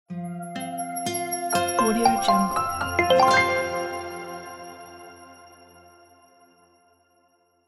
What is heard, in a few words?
calm ambient logo